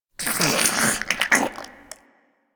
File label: Infested Close Goop Release; Ambience; Warframe; Infested Eris